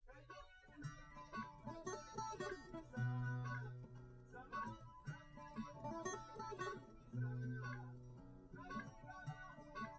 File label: nodrums liverec 250ms 1m sim; SiSEC08; SiSEC underdetermined